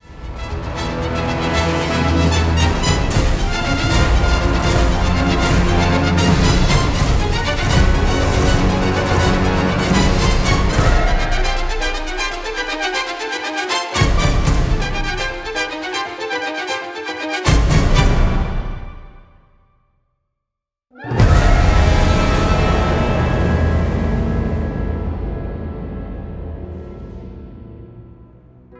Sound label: Orchestra Choir; symphonische werke